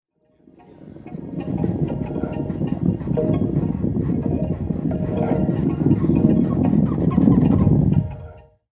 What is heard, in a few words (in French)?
bruitage eau; residence MDQ